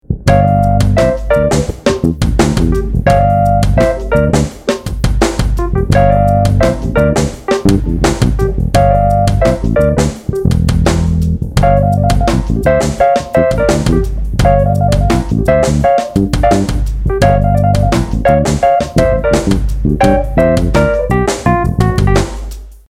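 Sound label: Major pentatonic groove, bass; a bassists guide to modes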